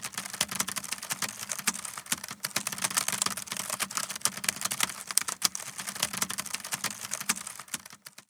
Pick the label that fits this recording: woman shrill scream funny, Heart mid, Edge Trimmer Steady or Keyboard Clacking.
Keyboard Clacking